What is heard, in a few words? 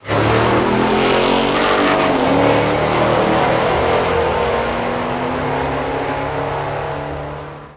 car drive; cashnoire